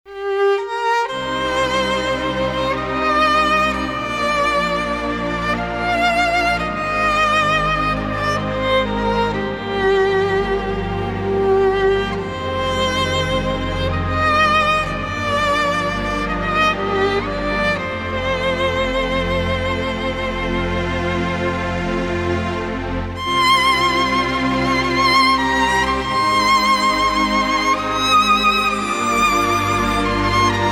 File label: Violin Playing